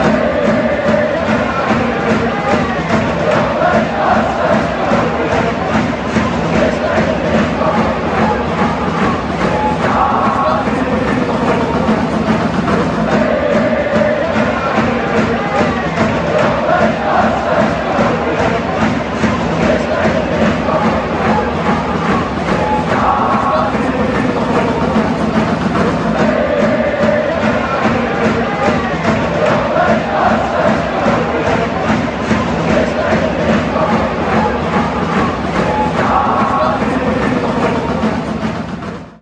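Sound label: rangers player chant, football chants; danilo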